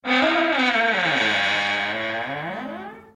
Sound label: door slowly closing